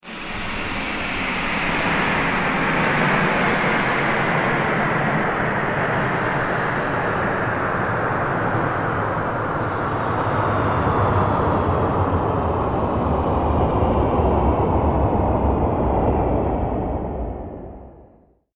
Science Fiction Spaceship Land Descend Short; Spacecrafts

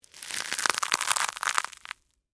Texture, Crunch, Cereal, Honey, Oats; Crunches; Objects

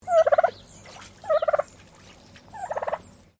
Bird Turkey Domestic Call Sequence

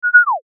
Beep Low Synth Descend; Production Element Imaging Element Accent Transition; Multimedia; Beeps

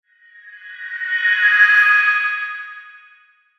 Sweeper Metallic Shimmer Flange; Production Element Imaging Element Accent Transition; Sweeper Sound Effects

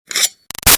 Detonator Metal Slide Knife like; Explosions; BigRoomSound

